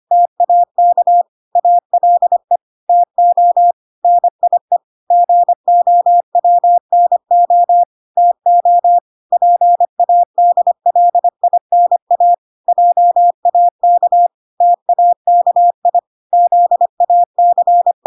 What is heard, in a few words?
25wpm